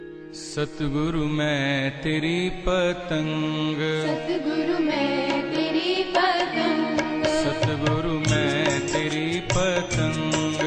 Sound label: Dharmik